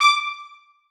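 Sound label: trumpets staccato c#6; Sonatina Symphonic Orchestra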